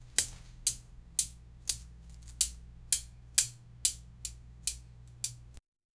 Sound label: katana swing clear; sword